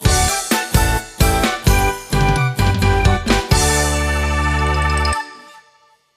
Music event; Music PSN; Jikkyou Powerful Pro Yakyuu Success Special; PS Vita

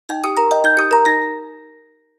success; Piloteer

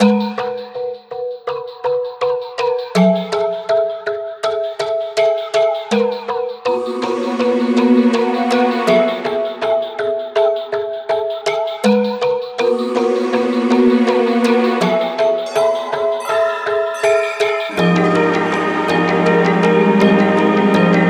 Temple thunder; CrossCode; Switch PS4 Xbox One PC macOS Xbox Series X Linux

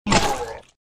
Sfx Enemy Lancer Dead; mighty knight 2 online gamerip 2015

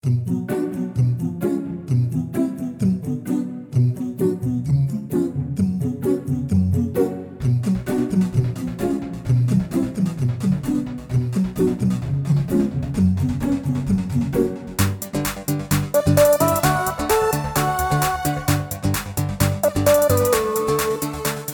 Wildlife LOOP; Trolls

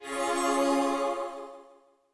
LevelUp SkillPoint; Overload

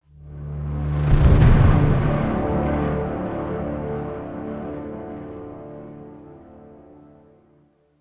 Action Low Clear; The Dark Knight Rises; Android, iOS

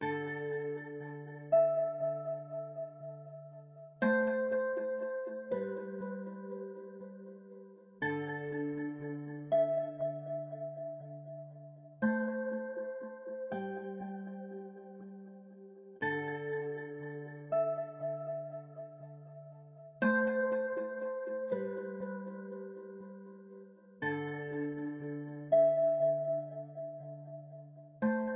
theme Guitar

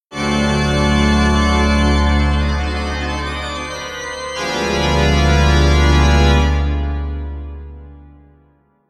Organ; assassin s creed unity

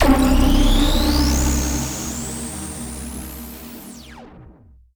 level end; Retrobooster

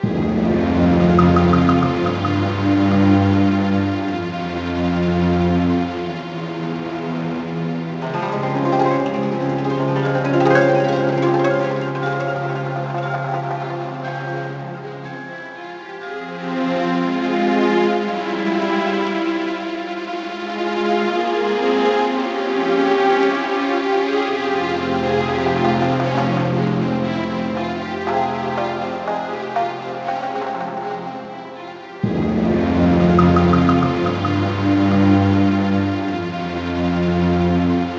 MUSIC LEVEL; The Quest Trio Jewels Cards and Tiles

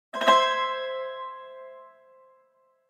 Surprise Dialogue Box (Yangqin); B Path of the Teal Lotus

